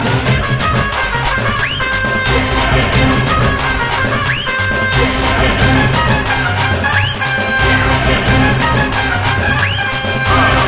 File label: Horn; Ipl5